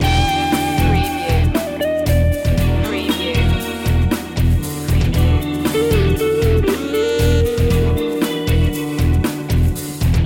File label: rock fusion loop